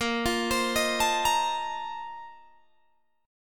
Bb Major 7th Suspended 2nd Suspended 4th, Guitar, Open G